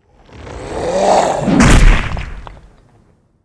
flesh golem attack

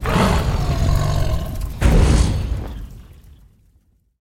dragonboss death, monster

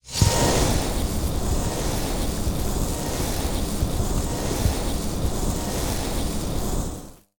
Trap Electric Turret; monster

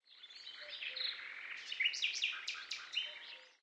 forest; portlux

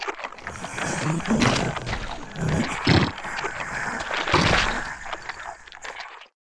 ant, monster

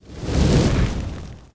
trap fire attack

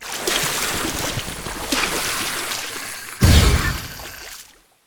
snake boss, monster

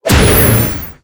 skill explo

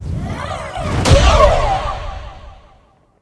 shade attack

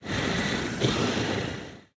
Wind Wolf, monster

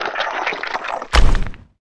crag turtle attack, monster

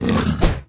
bug attack; dolphy

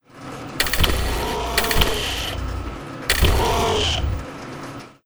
boss main, monster, steamer crazy

